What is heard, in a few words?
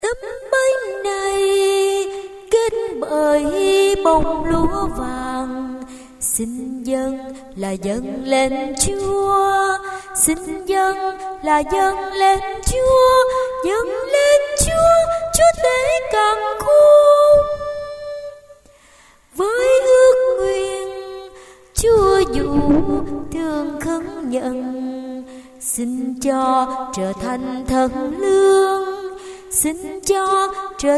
tenor